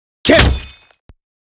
Ksatria M Attack

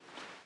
Sfx Baseball

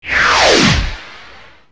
Cyclo Bomber Launch

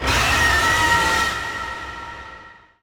Big Buzzer Scream

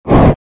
punch over; Roofscene

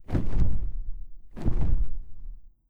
Skel Drake Fllap

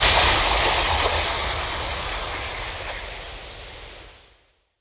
Nature Tail

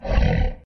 Creep Grunt; Dino Donkey Dash

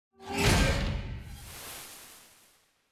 Battle Transition

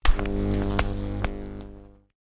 Ambience Scatter Machines; Santuario

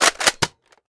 Toaster

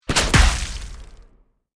Skill Pistol Shot; starbites

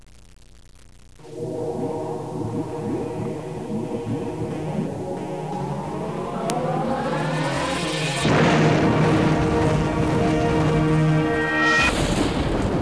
Logo; Gothos

